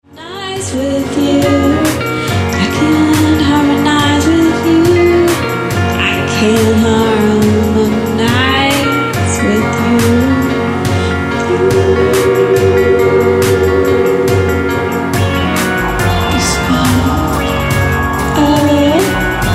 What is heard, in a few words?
Chorus